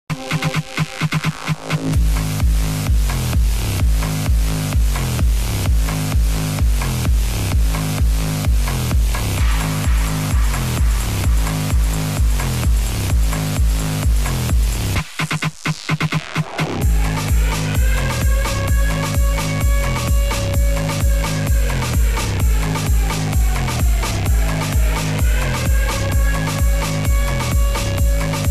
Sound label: Electronic Dj Sound